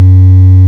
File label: SICK F#2, BASSES; Best Service Alpha Dance vol.2